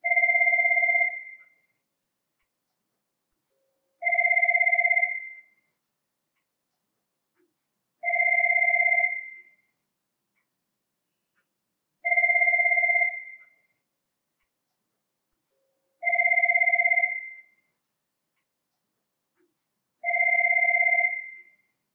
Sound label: phone